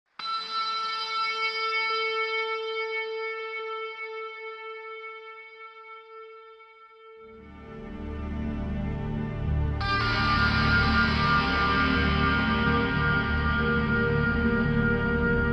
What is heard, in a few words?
lofi slowed reverb